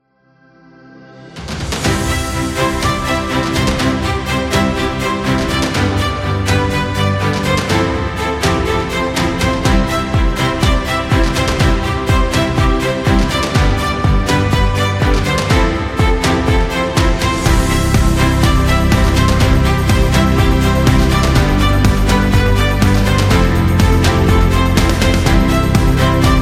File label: Learning Platform